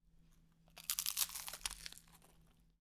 Crunchy bite

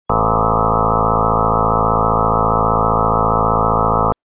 Meaty Buzz

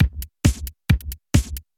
Tech loop

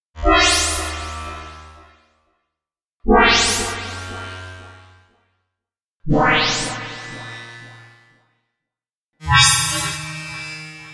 Electro Pulse Transition

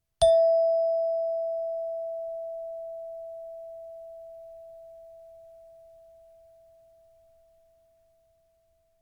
Chimebar E Low

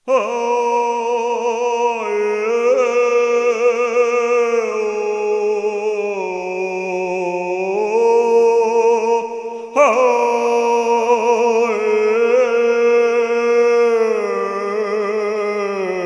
Arabian Male Prayer Song

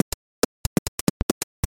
Drums

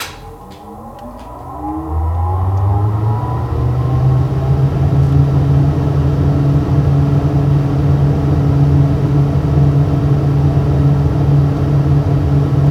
Suction Switched On

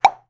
Bubble Pop Sound For Game And UI

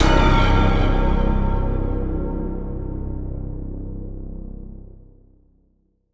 Horror Sting; Apollyon

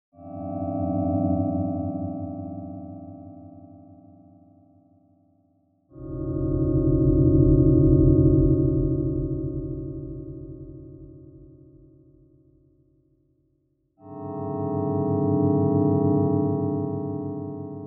Eerie Spooky Horror Sound

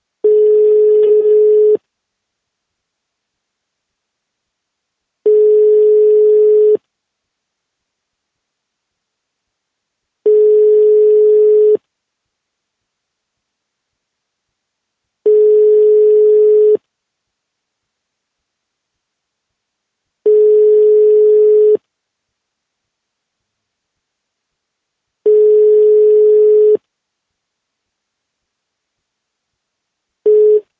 Phone Call Tone

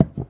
Lay Down Object

